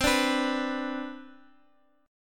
C Major 7th Suspended 2nd, C, down, Guitar, Open F; strummed; CM7sus2